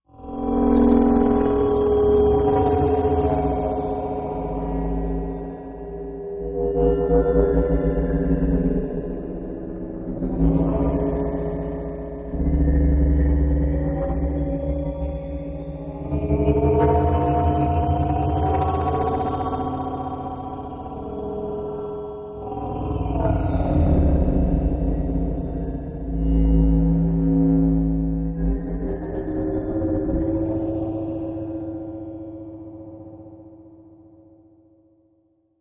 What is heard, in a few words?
Lost Plasma Electro Sta, eerie ambience